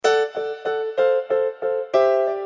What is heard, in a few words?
piano refrain